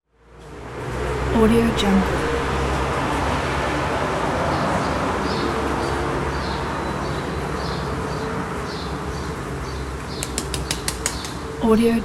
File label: city morning ambience with traffic